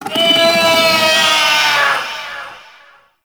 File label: Feral Vocal Spawn C; Warframe; Catbrow